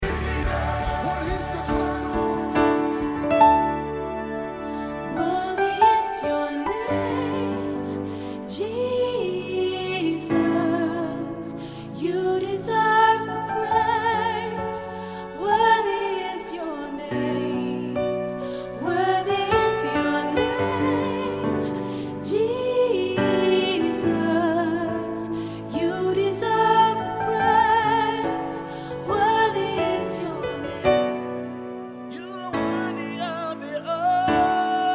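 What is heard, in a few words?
soprano; Malieveld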